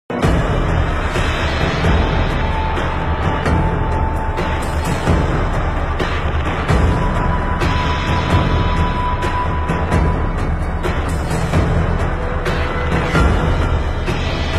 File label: drum; identityv newbgm